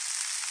joint loop

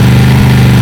v8 diesel, engines; acf engines

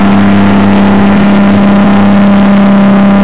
l6 petrol small, engines; acf engines

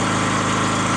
generator running

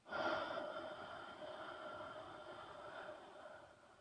bong exhale long

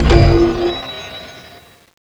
pickup

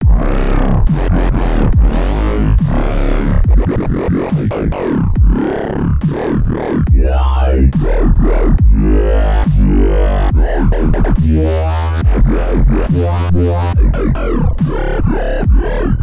meth consum music

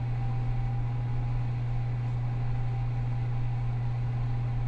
oven loop

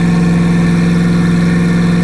v8 petrol, engines; acf engines

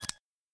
lock

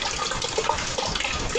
fill liquid

